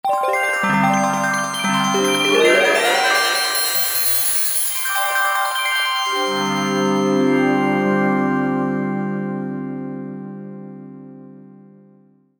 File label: Sea Of Bells, V-Synth